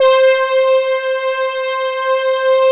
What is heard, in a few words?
BRASS, PADS; Masterbits; Vintage Voltage